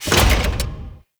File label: Powerplant Jettison Door Start; Gameplay; Warframe; Corpus Railjack; Tether Power Plant; Ambience